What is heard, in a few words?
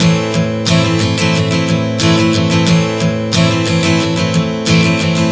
Strum 090 Am, One Chord Strumming 090 bpm